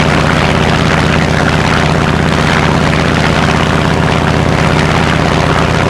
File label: zero rear, engines; War Thunder